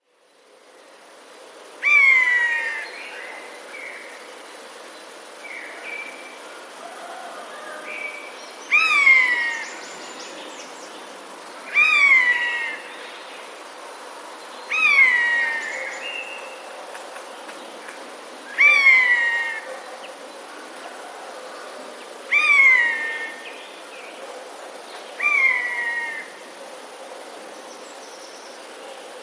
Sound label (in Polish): sojka; najpierw sójka zawodzi jak myszołów, a potem kracze